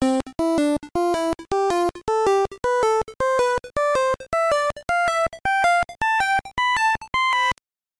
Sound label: Bonus Game Walk